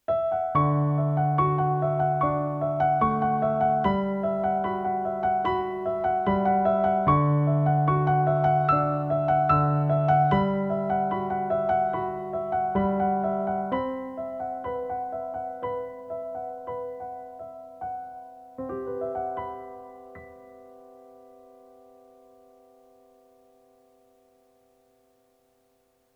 Mud Dash (Piano); A Bird Story

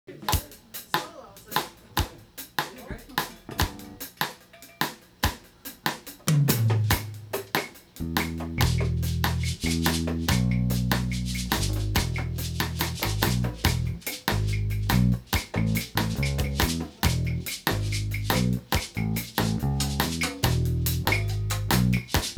Cha-Cha Perc

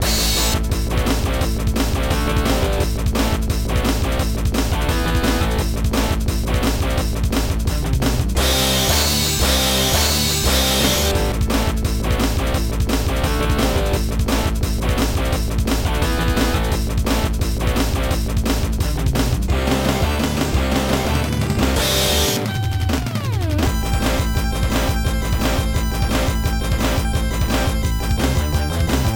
Multiplayer Race BGM, arcade; F1 Exhaust Note; System 32 arcade

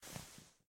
Stand Sound; Granny 3; Android

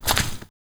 walk gravel; Aragami

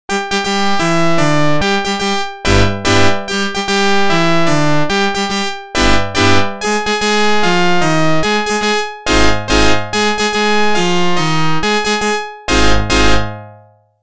Organ; NHL 94

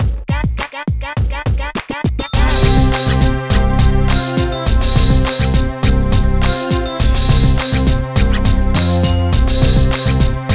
Music BPM100; Super Scribblenauts 2010 NDS

